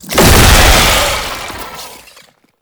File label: Tank Infested Postule Explosion; Enemies; Gameplay; Lasrian Tank; Warframe